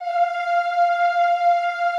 EEH F4, Classical Choir; Best Service; Extended Classical Choir

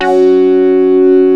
SYN ANA5; Best Service Gigapack 1

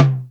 M1 TOM 1 HI; Greytsounds VOL-1

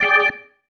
Stab One Shots, Organ

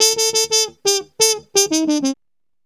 ACID JAZZ

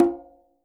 quinto; Sampleheads; NYC Percussionworks

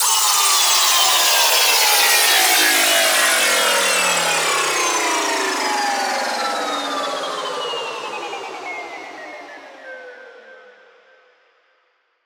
fx downlifter 150 extended release; Virtual Riot

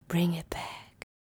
Bring It Back, Female Vocals

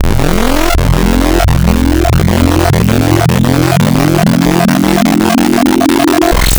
Modulo Additive, wavetables; Architecture 32

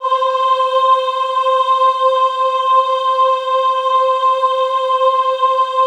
AHH C4, Classical Choir; Best Service; Extended Classical Choir; AHH MIX

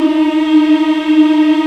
African Mm p; Roland LCDP11 Africa VOL-1

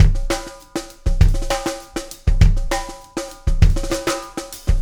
Beat, Loops

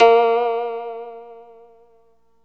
koto (Bb); Sonic Foundry (Sony Creative Software); Ugly Remnants VOL-2

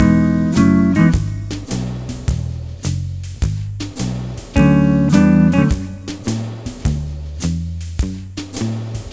Multi Guitar Swing Mix-E, 105; Zero-G Kocktail Kollection; Construction Kitz (Lounge)

